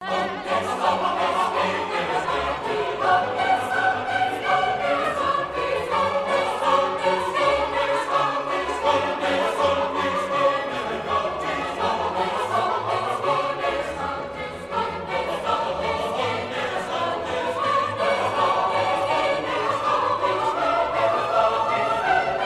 Magnificat in D Major, Omnes generationes; BWV 243; Das Schaffen Johann Sebastian Bachs; Serie A. Kantaten & Serie C. Messen und Magnificat

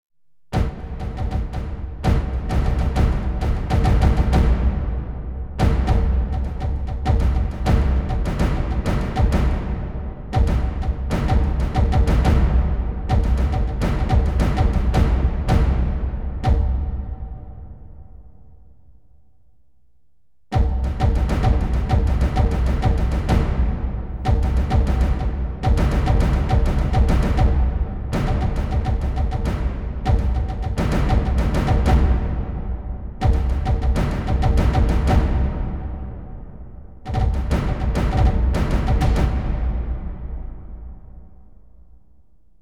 Percus; Troy

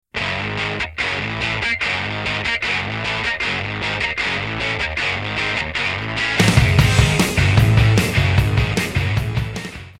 Guitar

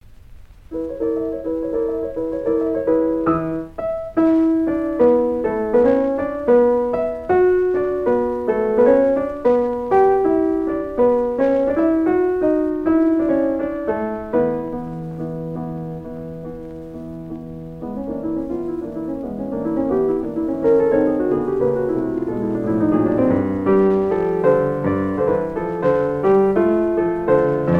Dance in Bulgarian Rhythm; Book VI; Béla Bartók; Mikrokosmos, Sz. 107

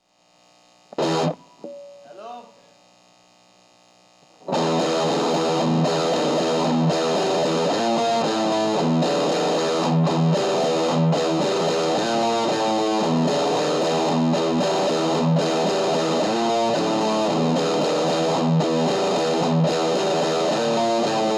Riff

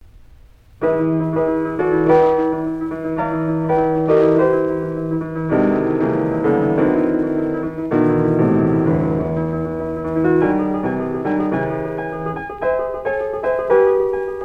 Dance in Bulgarian Rhythm; Book VI; Béla Bartók; Mikrokosmos, Sz. 107

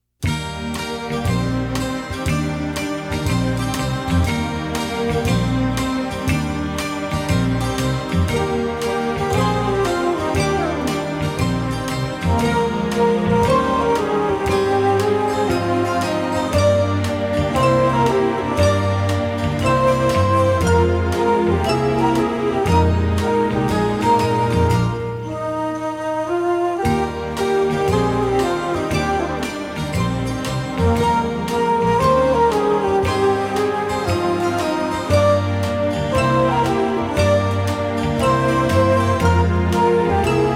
Strings Orchestra

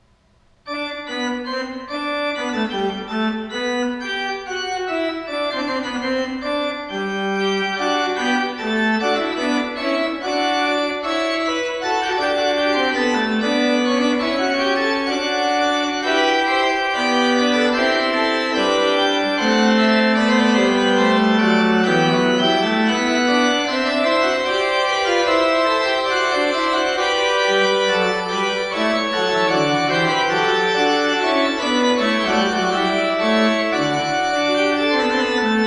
Fugue, Organ; Magnificat octavi toni; Johann Pachelbel; Complete Organ Works

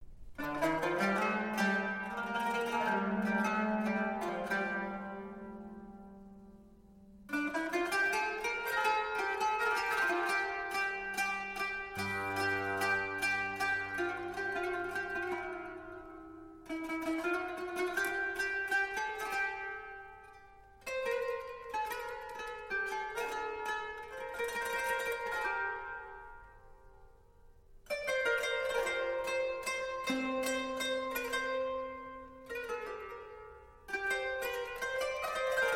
Postludium (Qanun)

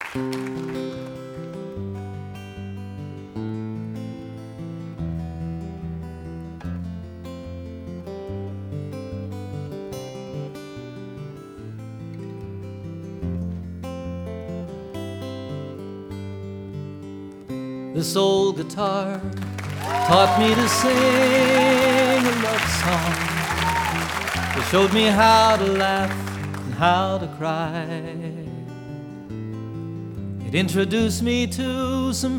Live

